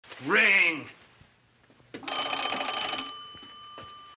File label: ring